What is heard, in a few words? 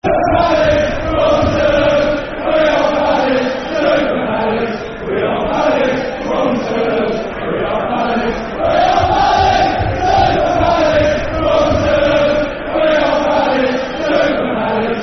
We Are Palace, Football Chants (Soccer Songs); Crystal Palace Cpfc